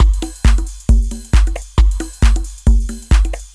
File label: Drums Techno